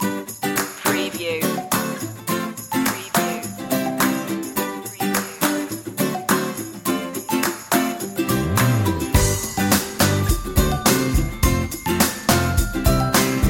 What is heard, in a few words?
easy and fun loop